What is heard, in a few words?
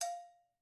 agogo Bell f, Struck Idiophones; Agogo Bells